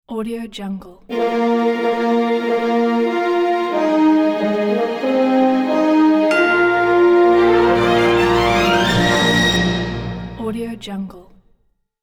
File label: stately movie logo